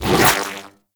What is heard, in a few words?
Shield Pickup; Warframe; Volt